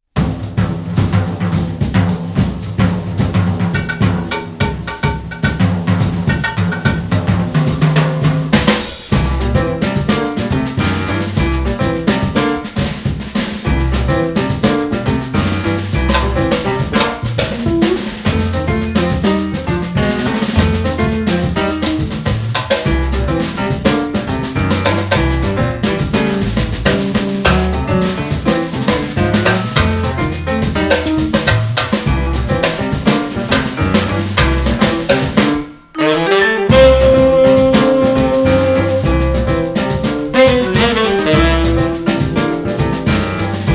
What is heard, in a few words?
Trio, Sax, Vibes